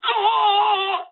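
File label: aircraft destroyed scream, speech japanese; War Thunder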